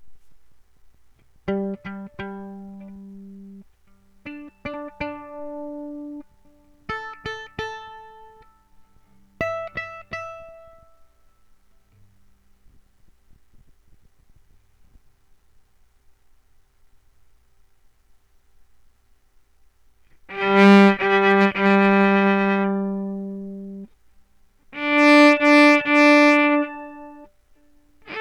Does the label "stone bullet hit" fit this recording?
No